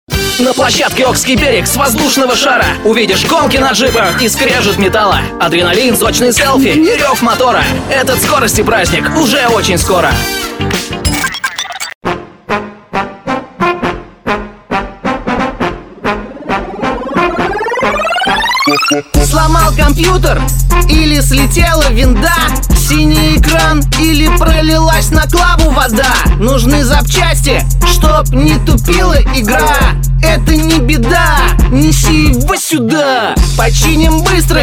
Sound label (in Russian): vokal